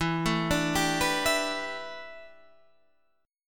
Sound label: A 7th Suspended 2nd Suspended 4th